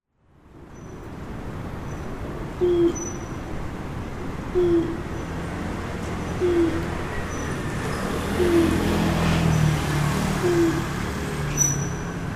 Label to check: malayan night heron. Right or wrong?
Right